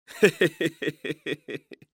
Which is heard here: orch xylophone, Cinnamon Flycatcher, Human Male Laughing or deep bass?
Human Male Laughing